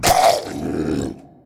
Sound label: zombie pig hurt